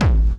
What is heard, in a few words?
Hard Kick